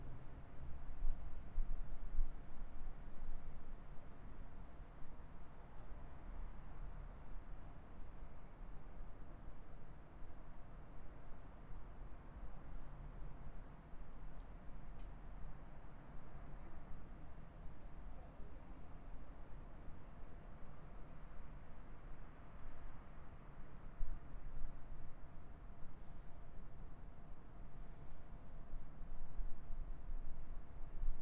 City parking lot copenhagen midnight ambience

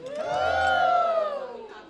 Cheers

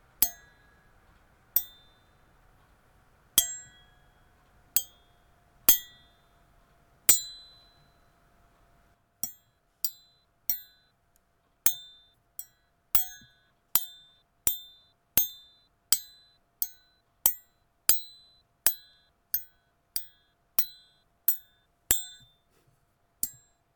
Metal Fork Hits

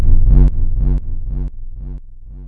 Reverb wave

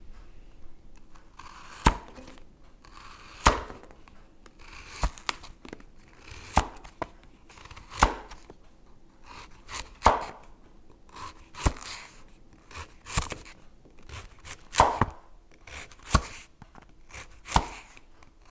Chopping

